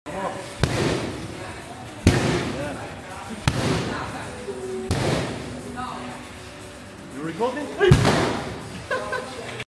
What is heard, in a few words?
Muay Thai; ورزش موای تای